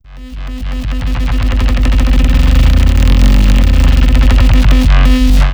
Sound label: Tom Roll Bass shot